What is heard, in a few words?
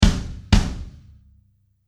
Double Impact; TS Sounds; imvest stress test; Unity